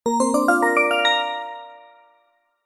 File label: cartoon ascend magical bright warm musical; zapsplat